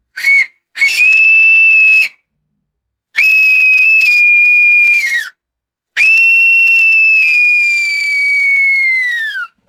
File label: sharp piercing bosun pipe whistle blasts